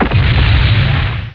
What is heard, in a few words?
boom